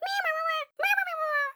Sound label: Grub Free